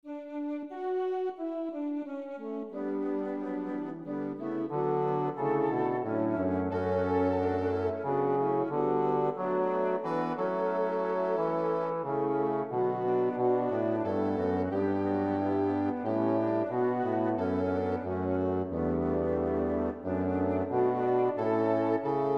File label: Bass, choir practice; chalice choir